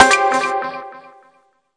hard echo hit, Synth

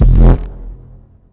bass fart; Basses

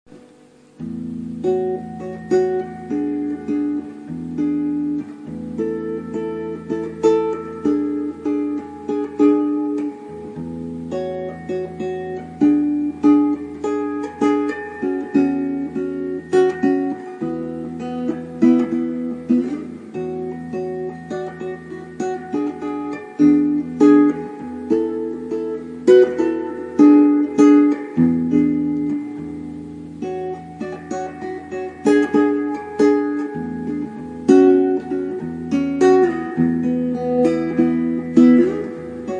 plucky reverby guitar song

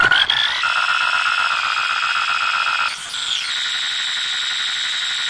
noise melody; SYNTH AND ELECTRONIC

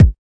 kik

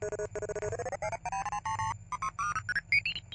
morse up, Filtered Morsecode Like

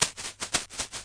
Shaker Found Percussion, 114 bpm; 074 to 128 bpm

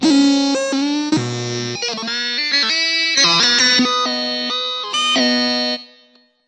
tuneless & dumb, Guitar Noizes

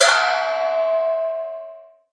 Processed Ethn Perc, ETHNIC and WORLD PERCUSSION

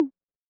Tom, Hip Hop Kit; drums; STYLE KITS (Hip Hop, Etc)

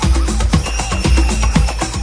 118 deep jungle percussion party

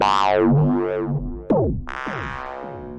160 sci fi echo blarts

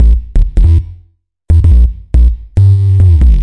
140 narrowfilter funkybass

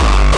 obnoxious kick

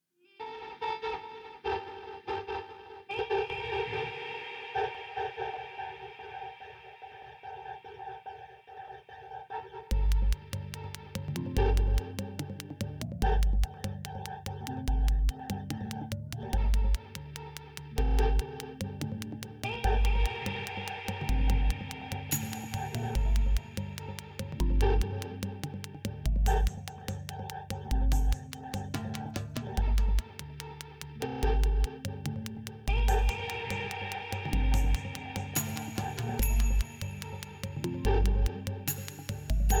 siren steps; digitalaudiofunktheory

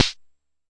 Jomox MBrane, drum machines; Jomox MBrane 11

